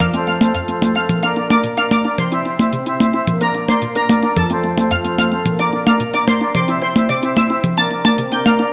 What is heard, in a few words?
Calypso